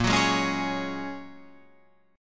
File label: A sharp Minor 7th Sharp 5th; 6,9,6,6,9,9; strummed